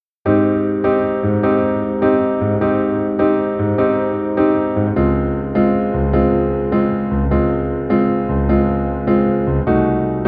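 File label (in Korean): Piano version 멜로디 MR -1키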